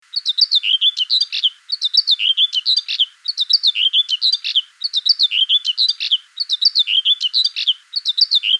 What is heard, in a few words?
whitethroat